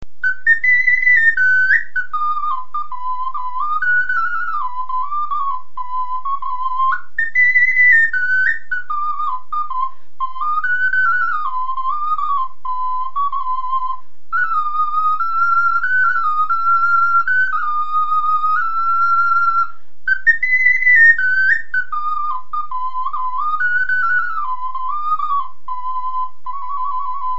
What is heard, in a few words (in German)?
tonflö klein melo